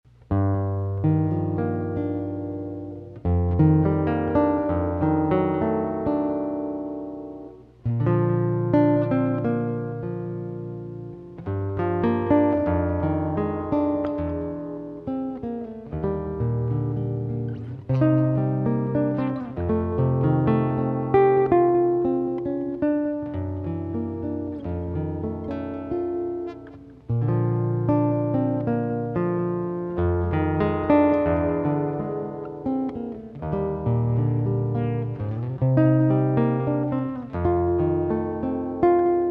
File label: Jingle Bells Bossa Nova